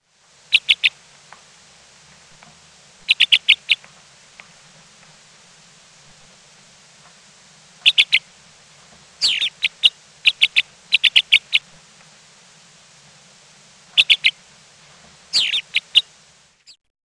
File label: black rosy finch